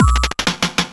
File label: Fill Ins 128BPM; Vengeance Samples